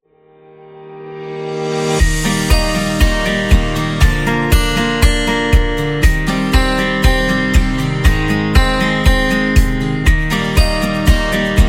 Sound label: Food Beat, ukulele